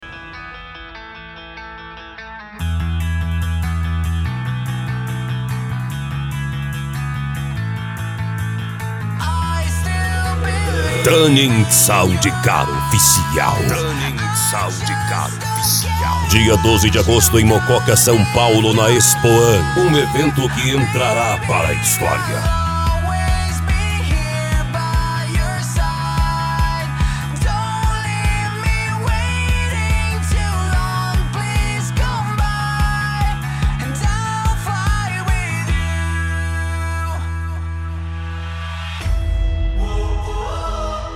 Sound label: sound car